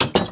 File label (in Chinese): 铁门 关闭声